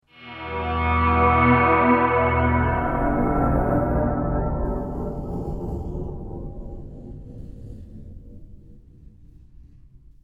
Guitar Chord Musical Magical Reveal Swell Transforms